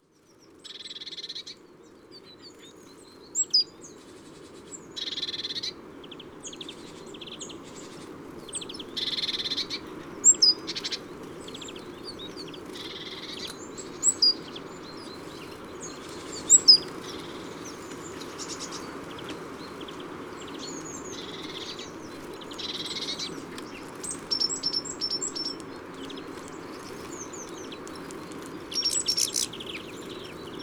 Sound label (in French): Mesange a la mangeoire les Ollieres sur Eyrieux; Ambiances Sonores d'Ardeche; Studio Les Trois Becs